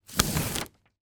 office printer label peel from plastic backing sheet